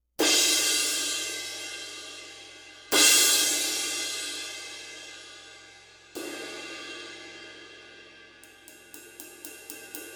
24 Paiste

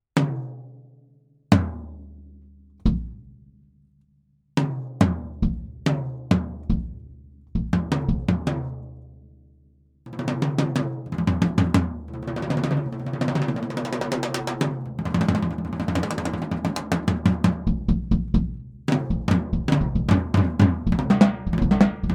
Ludwig Downbeat

